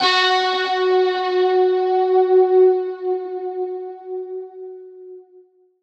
tonal oneshot F#; Slate; SD PASADENA Pop Sample Pack

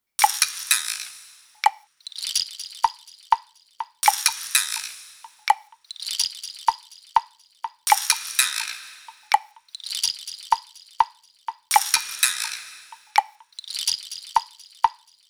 perc loop 125, Pop, Drums; Loops; Slate; SD PASADENA Pop Sample Pack

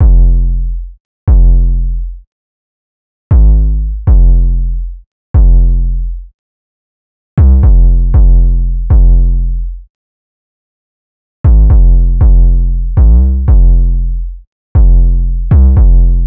808 loop 118 Fm; Slate Digital BASSHOLE Sample Pack

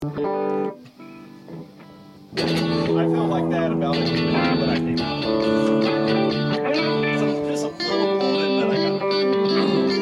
Background Noise Mix

